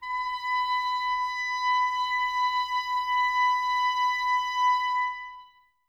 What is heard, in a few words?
clarinets, Wood Winds